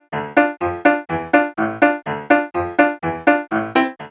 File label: piano 8 beats